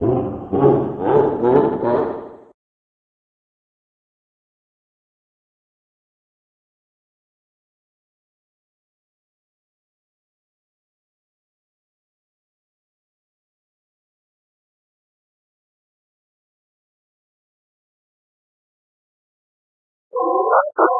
Retry Jingle; Friday Night Funkin Mario's Madness

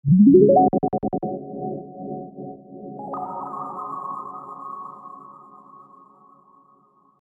level up; The Deer God; Wii U

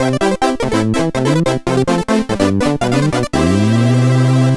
Level Up; Obsidian Prince

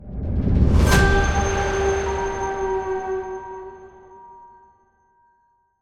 Unlock Ice Level; Towaga; Android

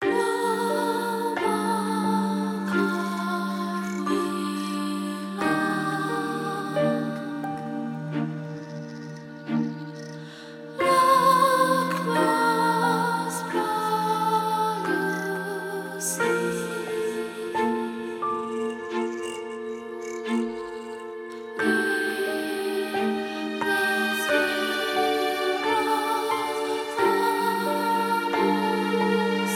Boss Battle Theme; Nyakori Boss Battle Theme A

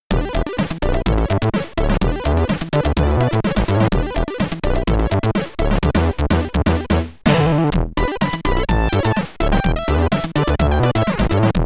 BGM, SID Stereo, Commodore 64; Ball Fever

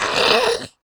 Hiss Hit; The Battle for Wesnoth

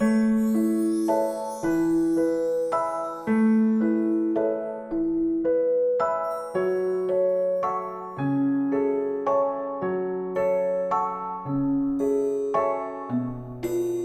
music pause menu

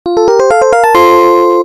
Checkpoint; Cheburashka; J2ME

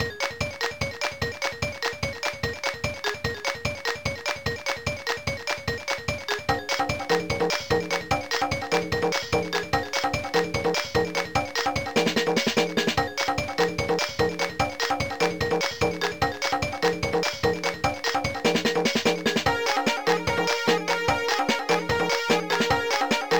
Level BGM ~ Credits; Titeuf Ze Gag Machine; GBA

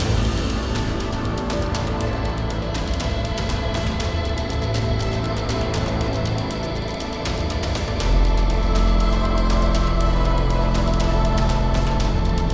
Fighting Phase; Nebulous Fleet Command

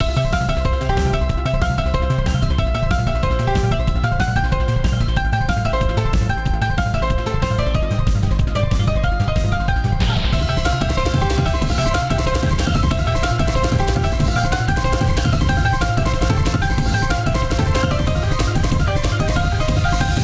Jingle- Speed Up!; Dueling Ages The Sonic Time Twisted Original Soundtrack

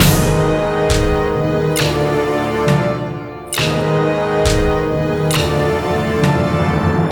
LOOP music game over; Hercules

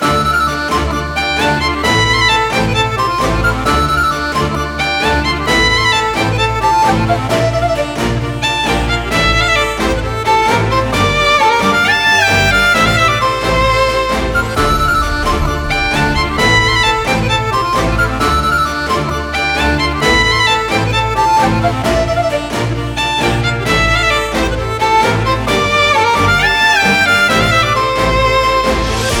First step loop

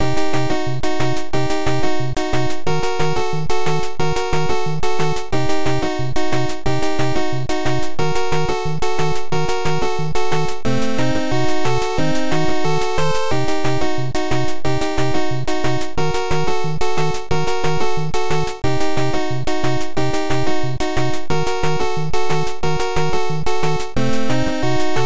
Boss BGM; Pocket Zaurus Ju Ouken no Nazo; Family Computer

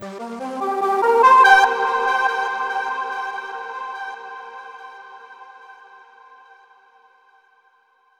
Stinger Puzzle Tone

